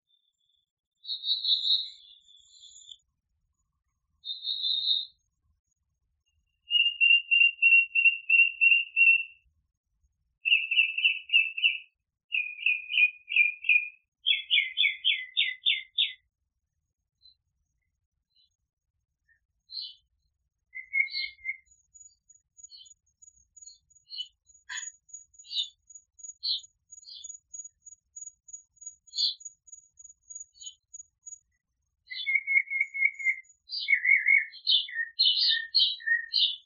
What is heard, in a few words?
Birds